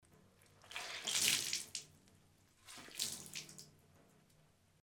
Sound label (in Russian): vyzhimanie